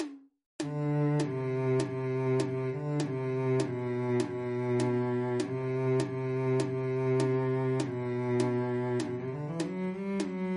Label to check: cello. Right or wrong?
Right